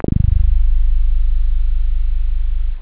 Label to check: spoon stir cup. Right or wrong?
Wrong